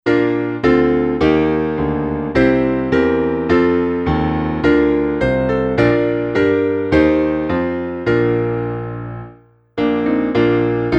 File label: piano